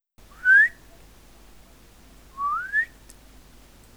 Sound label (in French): sifflement